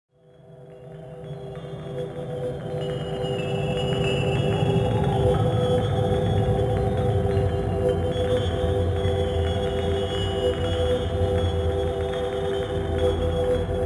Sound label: dream atmosphere